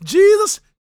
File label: gospel male, vocal; ILIO; Vocal Planet